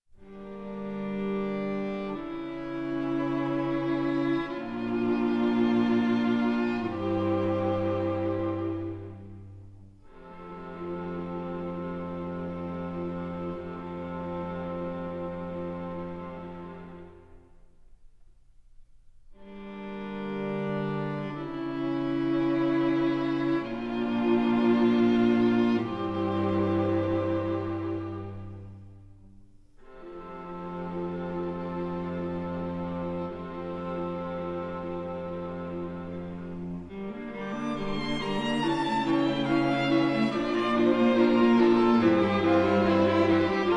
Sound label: string quartet no 4 movement iii